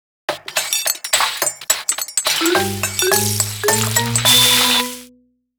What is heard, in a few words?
Cooking Fanfare (Major Success); The Legend of Zelda Breath of the Wild